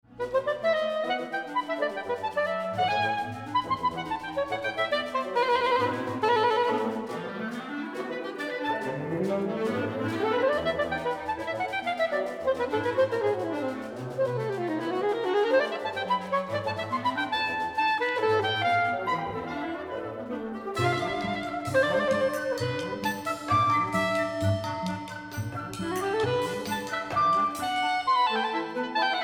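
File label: Soprano Sax Concerto, Wind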